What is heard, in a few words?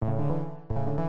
ringtone